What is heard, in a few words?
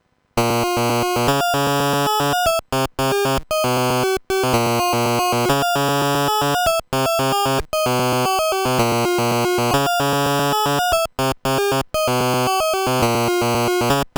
noise LFO